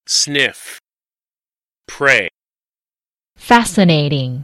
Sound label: sniff; Strange Animal Senses 奇妙的动物直觉; 嗅，闻